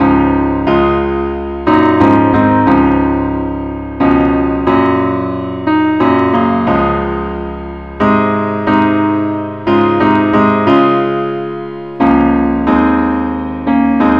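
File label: parts only key D flat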